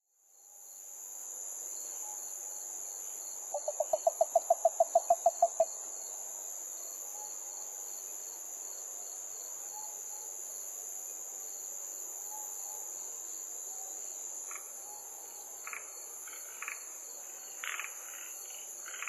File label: yaeyama harabuti gaeru